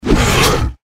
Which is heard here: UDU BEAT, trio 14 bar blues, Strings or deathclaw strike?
deathclaw strike